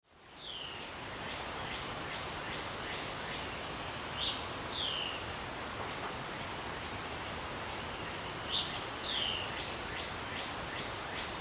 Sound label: Birdsong; Jasmine; returning for the harvest